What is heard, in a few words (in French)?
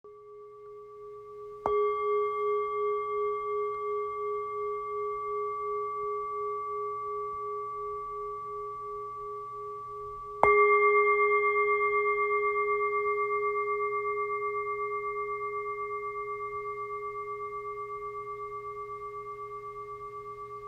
Bol 13 cm; bol chantant 7 metaux